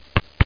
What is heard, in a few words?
WALK